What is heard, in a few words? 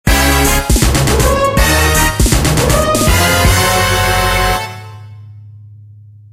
Jingle, Bowser Jr. team jingle